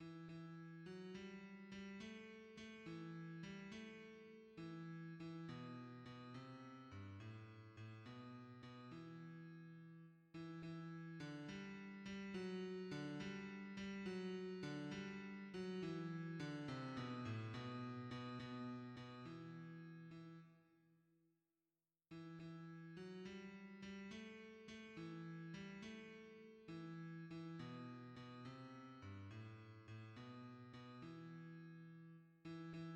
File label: bass, hymns; O jour d angoisse